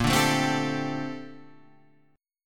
A sharp Major 13th A sharp; A#M13; strummed; JGuitar

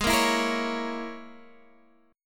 E 6th Flat 5th Ab

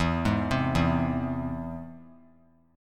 E Augmented; E+ arpeggiated; JGuitar

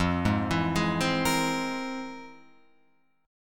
F Minor Major 11th, Guitar, Open F; arpeggiated; JGuitar

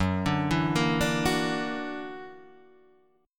Gb Augmented 7th Gb

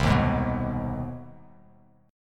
C Minor Major 7th Sharp 5th, Bass Standard; CmM7#5 strummed; JGuitar

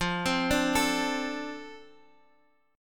F 6th F down Guitar Standard; F6 arpeggiated

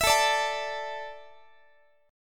A Suspended 4th down Guitar; Asus4; strummed; x,x,19,19,18,20

